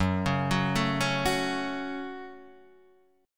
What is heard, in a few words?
F sharp Minor, Guitar, Drop D; chordshape; down